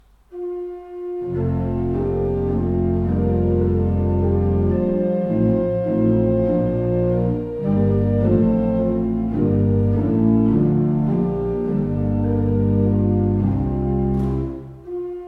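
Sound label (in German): Orgel, pfeifenorgeln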